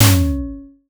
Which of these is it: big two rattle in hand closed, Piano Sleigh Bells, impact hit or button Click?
impact hit